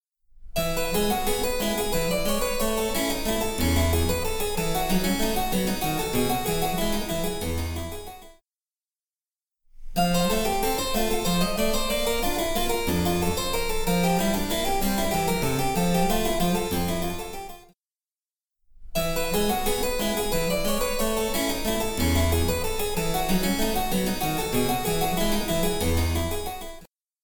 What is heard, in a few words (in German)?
Cembalo; Mark Lindley WTC 1